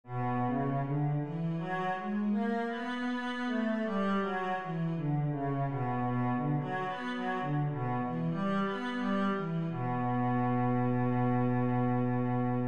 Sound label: b minor, scales, cello